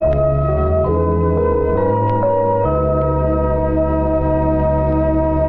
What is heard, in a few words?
keys; loops